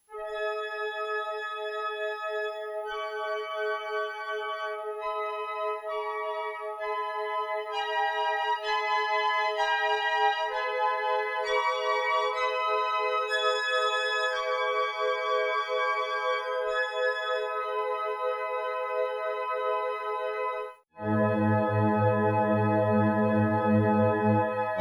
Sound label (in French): FM DX7 Violons, synth; en attaquant doucement on obtient des nappes. En attaquant vivement, on ajoute des coups d'archets, donnant une son plus orchestral; Démo audio des fiches de sons FM détaillées dans le livre